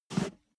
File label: step barefoot metal slide short